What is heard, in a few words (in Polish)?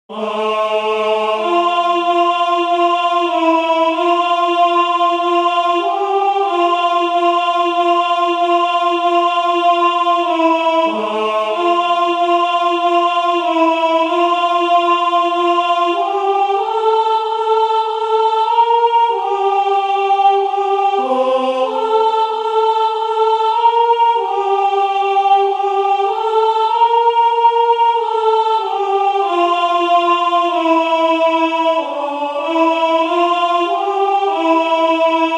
sopran